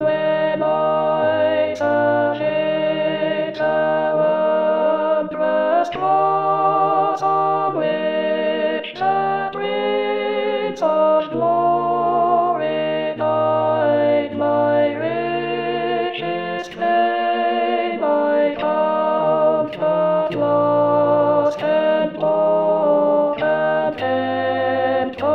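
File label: When I survey Alto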